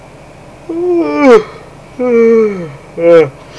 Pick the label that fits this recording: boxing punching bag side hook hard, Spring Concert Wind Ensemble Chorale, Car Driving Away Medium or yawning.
yawning